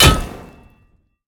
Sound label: basic impact; smite; thanatos